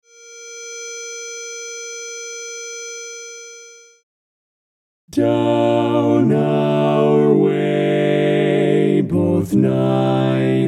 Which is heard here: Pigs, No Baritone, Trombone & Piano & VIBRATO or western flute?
No Baritone